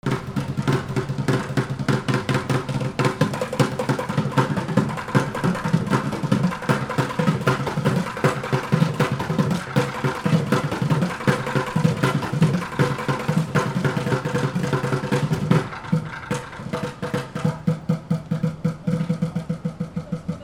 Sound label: AMBIENCE